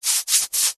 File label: human bare feet skin rub together